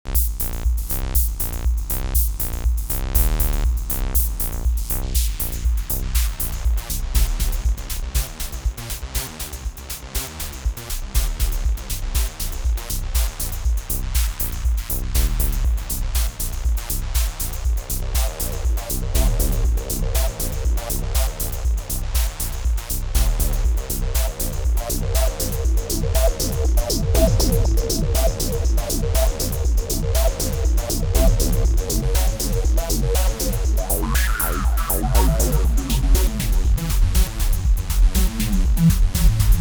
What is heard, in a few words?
JunoDS88 Combination Auto Trance Cut off Reso